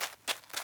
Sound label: Footsteps Dirt transition